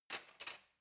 cutlery knife drawer grab

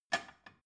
plate shelve soft short

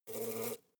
hornet hum short

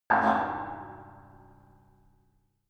metal impact tank inside

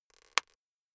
plastic slowly highly pressured

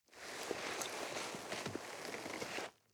nylon leather soft rustling

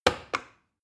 plastic button start click heavy slow

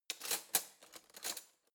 cutlery spoon drawer handle long

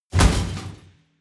metal crash small

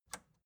record player power release on

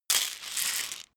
pillbox shake

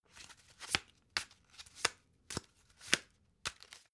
playing cards shuffle handle

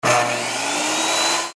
electric fret saw

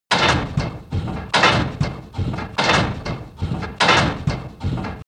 industry machine mechanic metal low

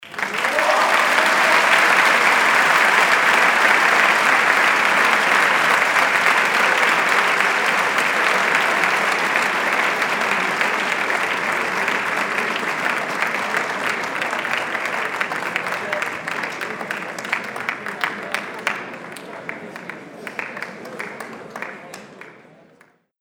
applause loud

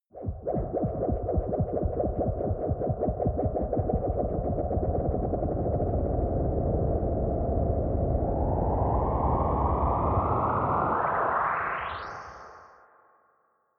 ufo take off wobble long

